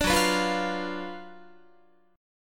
Gb Major 7th Suspended 4th Sharp 5th, down, Guitar; GbM7sus4#5 strummed; 17,14,14,15,15,16; 4A,4E,5A,5D,5G#,6C#